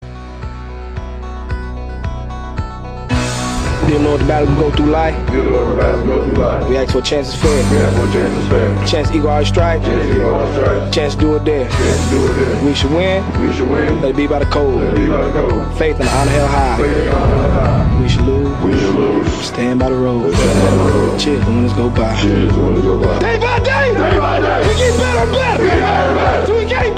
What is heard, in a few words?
Tunnel walk chant